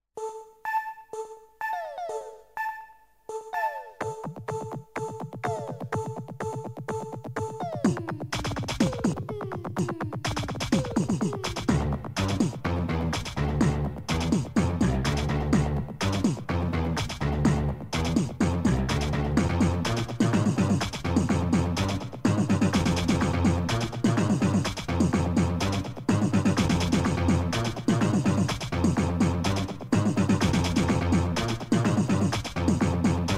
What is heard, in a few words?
Backbeat